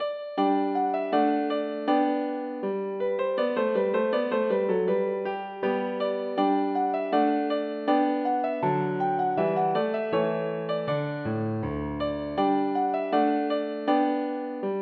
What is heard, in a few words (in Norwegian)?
Marsch for piano; Aamodt